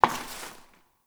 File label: Step Perforated Metal; Warframe; Koumei